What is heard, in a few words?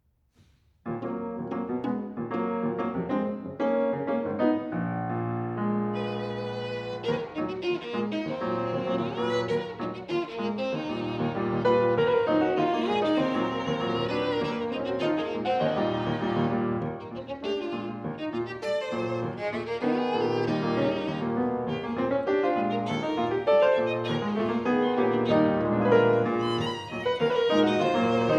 violin piano; Gershwin Prelude No. 3 Agitato